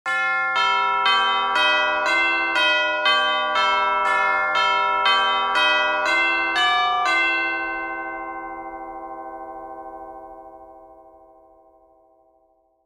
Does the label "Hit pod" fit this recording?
No